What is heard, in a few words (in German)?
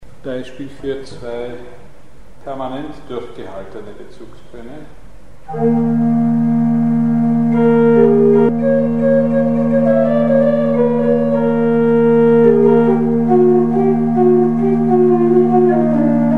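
Bezugstöne permanent durchgehalten; Mikrobuch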